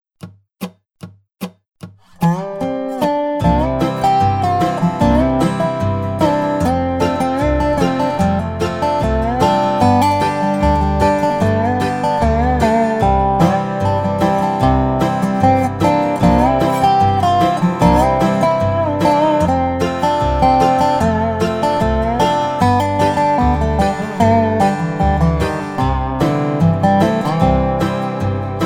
key of C Dobro and backing track